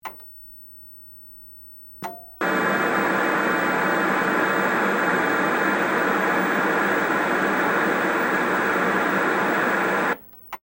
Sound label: Television On Static, Technology, Television, On, Static, Off, Large, Tube, High, Pitch, Tone, Loop; BlastWave